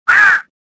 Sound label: choke, worker; worker2